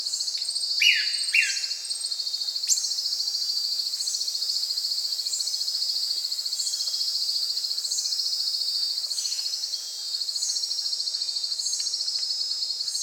Brownish twistwing; پرنده تابیده بال قهوها

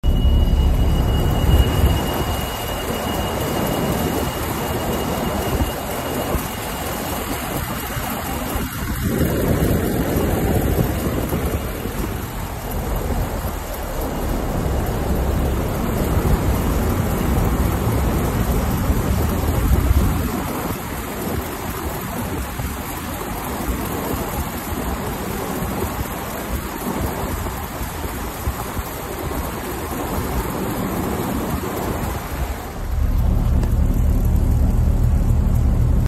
wheel chain

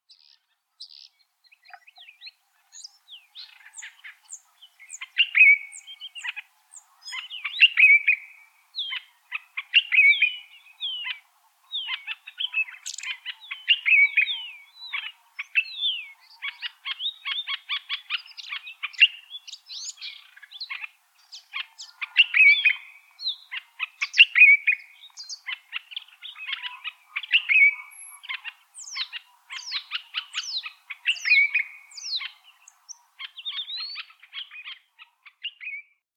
African red eyed bulbul; پرنده بلبل چشم سرخ

